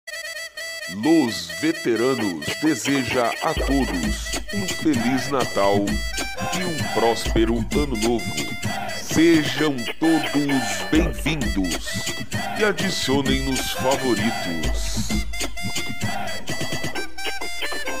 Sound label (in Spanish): Musica De Entrada; cstrike